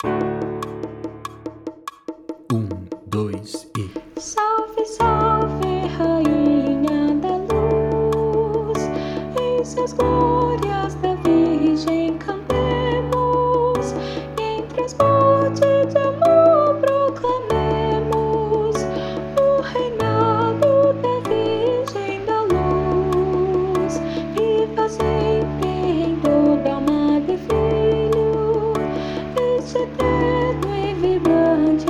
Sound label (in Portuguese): soprano; estudo